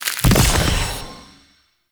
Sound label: Ground Spike Destroy; Enemies; Abilities; Warframe; Zariman; Void Angel